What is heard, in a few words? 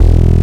BASS, Drum & Bass, 160 BPM